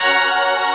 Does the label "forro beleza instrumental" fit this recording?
No